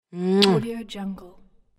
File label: female kiss